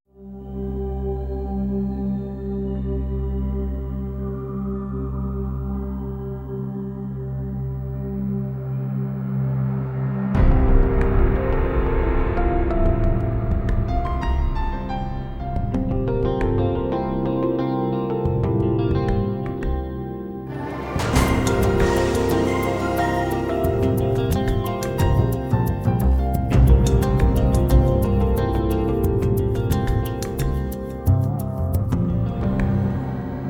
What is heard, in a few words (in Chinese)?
纯音乐背景音乐